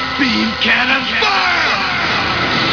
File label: beam cannon